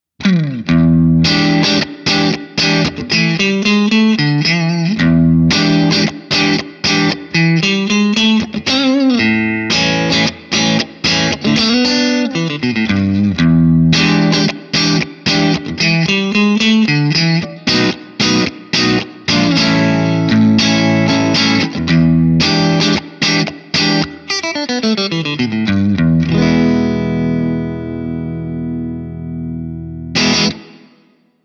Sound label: breakup; jensentone